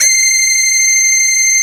JV JAZZY FLU, JV-1080; Syntec; Wall of Sounds

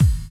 BD; Best Service; Dance Mega Drums

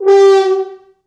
4 FrHorns FXMOD; East West - Quantum Leap Horns Sax; 90's Sample CDs

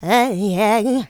Vocals, Adlibs; Houseworx

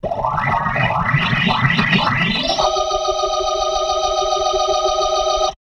B3 RISE D#

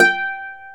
Celtic Harp G4; Planet Earth; Digital Sound Factory Planet Earth

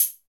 HH HH, Dance

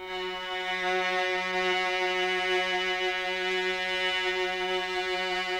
CLN STG G2, CHAMB STR; Sonic Reality; Vintage Timetraveler

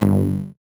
VocoBit Noise, 8-bit; musicradar; 8-bit bonanza

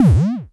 KICK BOUNCE, Dual Percussion Synthesiser; Klone

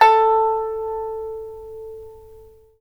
PIPA A5; Spectrasonics; Heart of Asia

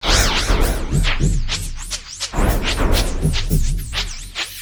PLAS FX, Industrial Loops; USB Soundscan vol.24; AKAI

